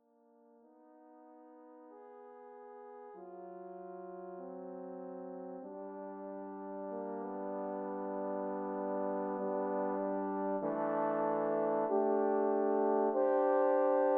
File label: Sonata for 3 Horns, midi